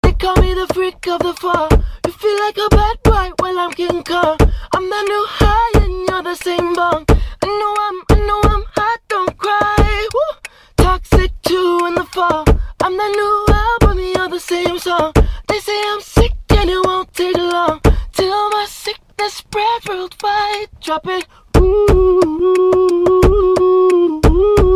keyboards

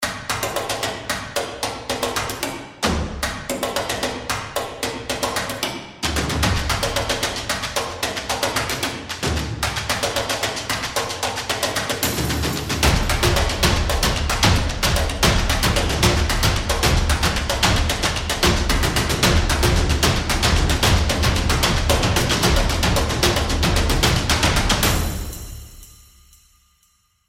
drumz

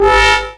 sms jerks; ringtones

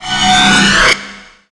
Amp Pickup

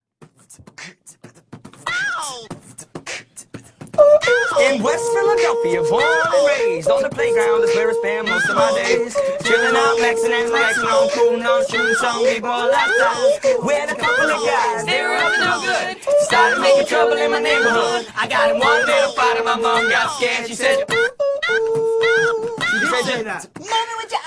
rap

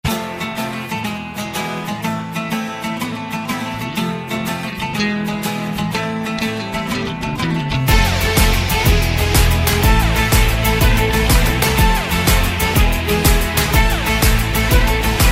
guitar energy

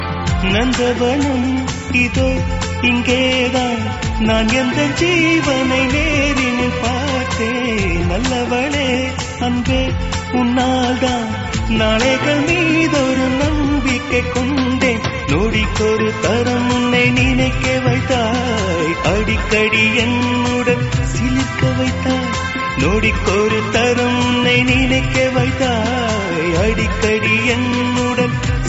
aahaa, ringtones

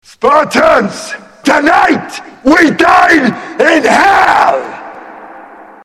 sms

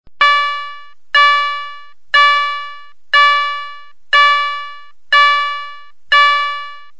chime car door open

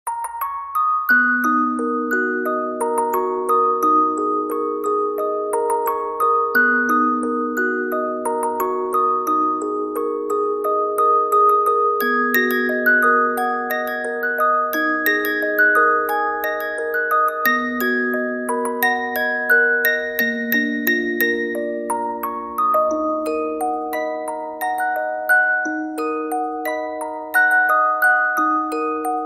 Music Box

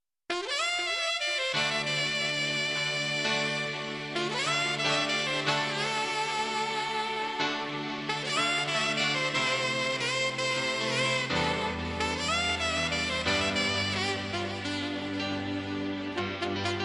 sax; alternative

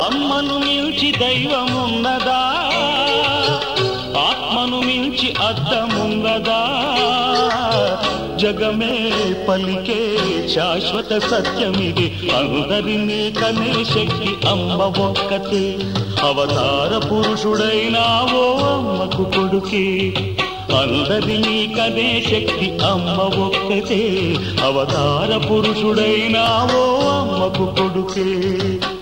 telugu ringtone

